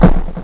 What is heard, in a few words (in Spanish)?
Letras Shot; Zeta